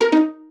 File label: jingles pizzicato; Kenney; Music Jingles